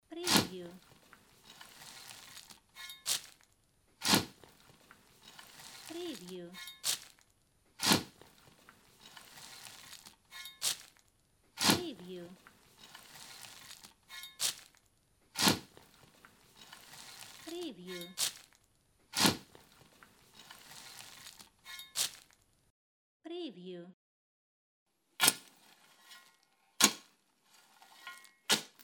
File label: Spade Digging